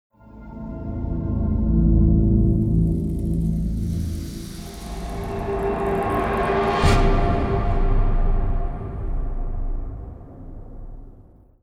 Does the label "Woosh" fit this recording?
Yes